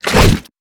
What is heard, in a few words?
worm attack bite; Noita